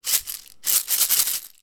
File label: tube of sweeteners several shakes; food drink